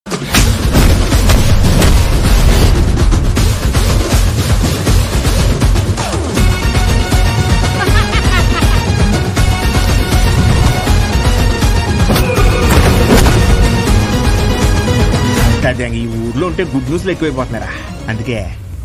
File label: movie bgm ringtone; rangabali